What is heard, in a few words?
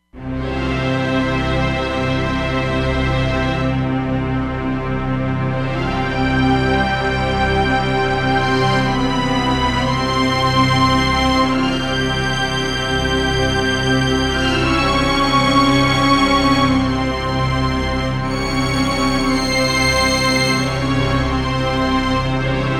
Sahara Str, Roland Fantom XR